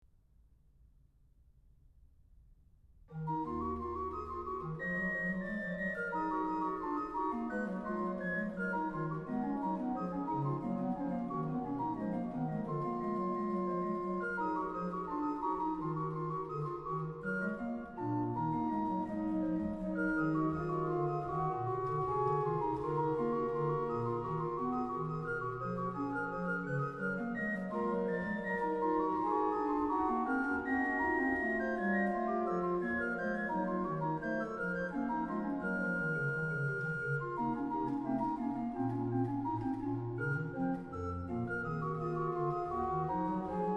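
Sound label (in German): Wo soll ich fliehen hin, Orgue; BWV 646; Schuebler; Vollenhove; Enregistré le 4 avril 2020 sur l'orgue virtuel Klapmeyer de l'église St. Nikolai d'Altenbr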